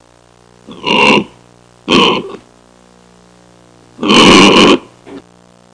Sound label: Angry pig grunting sounds